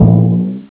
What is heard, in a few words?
jump rock; Nokia; 5800 XpressMusic; Bounce Touch